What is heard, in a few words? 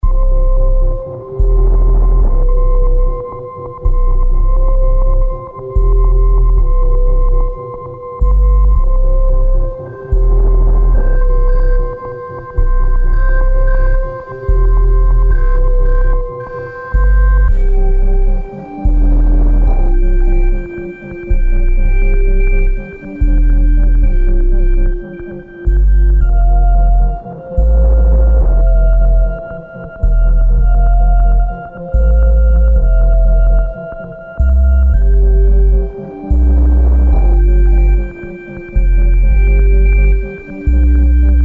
horror